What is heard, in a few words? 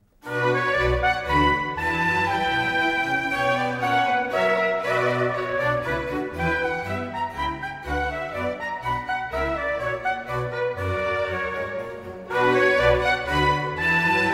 Orchestral Suite in B Minor, Menuet (Transc. for Trumpet; Bach, JS; BWV 1067; Les 100 chefs-d'œuvre de la trompette